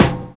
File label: NO RING; Univers Sons